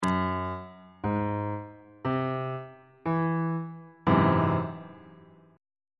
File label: g sharp augmented 7th 3rd inversion on bass clef